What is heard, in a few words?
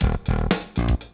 beat